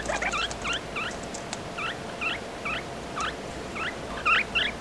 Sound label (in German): locken, Katharinasittiche, Das Männchen lockt sein Weibchen durch zarte, fiepende Laute; um ihr beispielsweise eine tolle Nistmöglichkeit zu zeigen